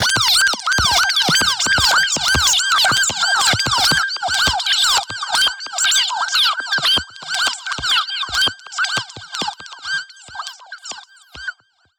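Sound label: Modulation Proc, alarm siren samples, 120bpm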